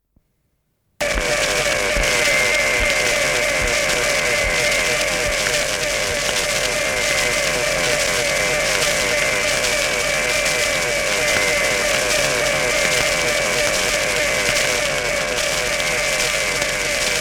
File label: Fading wartime sounds